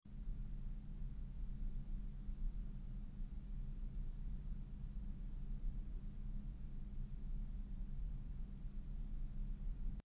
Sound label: Room tone